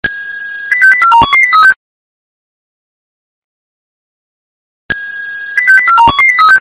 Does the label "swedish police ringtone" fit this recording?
Yes